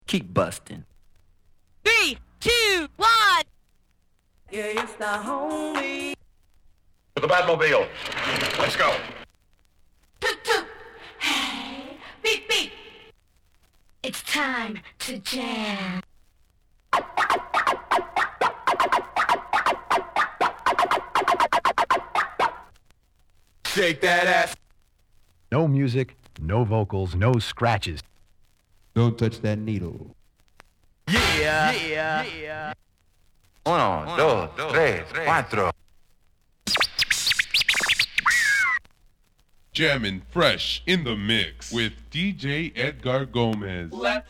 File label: samples scratches; wicked mix vol 009